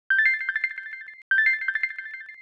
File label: 80s Arcade bonus; Nokia; Luster